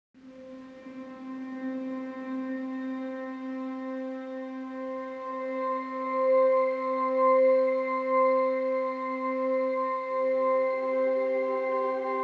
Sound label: stems